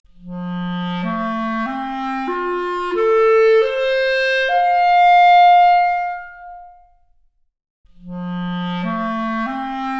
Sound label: Clarinet arp, Stereo output; 4bit